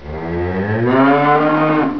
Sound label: bull die; svencoop; hunger